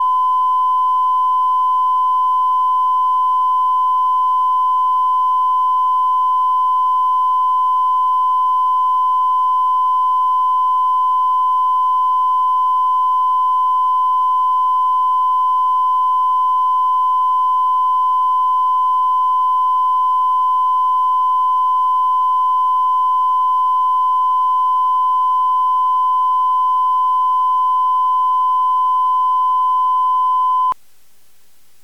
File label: rode test ai micro